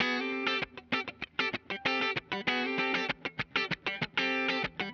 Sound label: Clean Guitar; Stringed Instruments